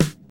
studio snare drum d sharp key, Royality free snare tuned to the D# note; studio snare drum sample; snare drum samples